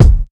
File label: fluffy kick drum one shot d key